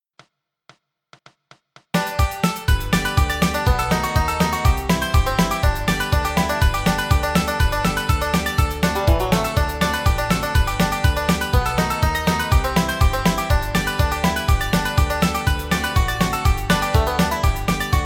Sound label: Bluegrass Banjo fiddle G